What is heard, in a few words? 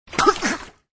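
Female Drown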